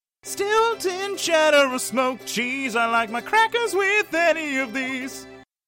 cracker song

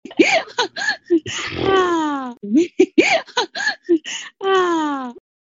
happy laugh

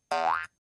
jump boing

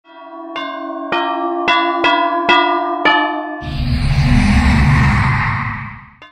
ringtone invisibility bell; dead by daylight

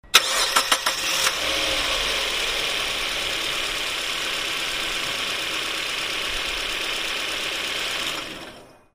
car engine

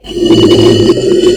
burp

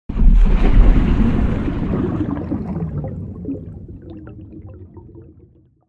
underwater

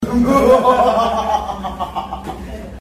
evil laugh

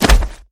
hit grapple

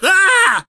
alien scream

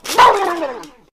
death sound; nicos nextbots

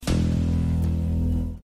tension

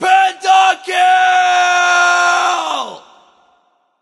alert notify kill

